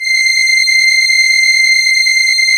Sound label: Elka Str C#7, Elka Strings; Keyboards of The 60's and 70's